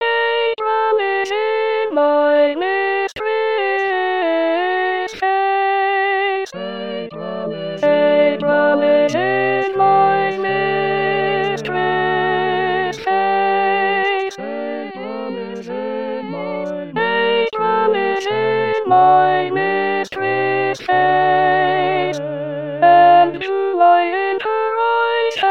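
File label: April is in my mistress face Alto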